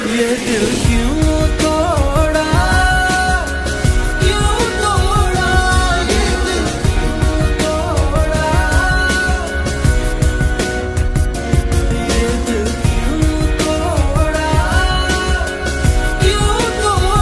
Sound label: Heart Broken Sad Ringtone